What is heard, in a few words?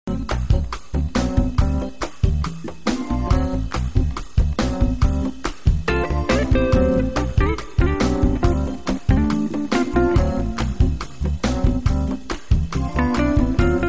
Jazz; In Store Music